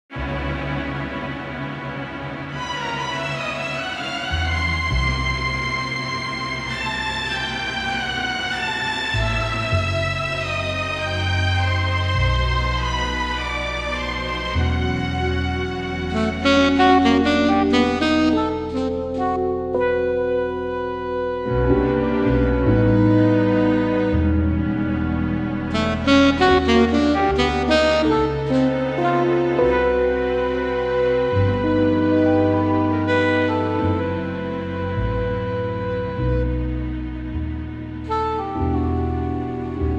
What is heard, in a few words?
Blues in E